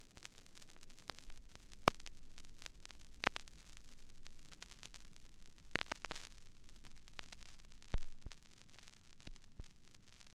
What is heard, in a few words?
Vinyl Noises